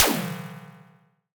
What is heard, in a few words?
Alien FM Perc Short